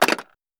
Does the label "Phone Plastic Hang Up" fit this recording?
Yes